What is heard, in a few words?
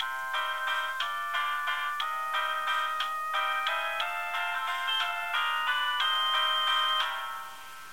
waltz ringtone; gta iv